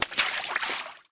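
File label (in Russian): всплеск выпрыгнувшего из моря дельфина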